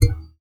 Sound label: Classical Guitar Extended Technique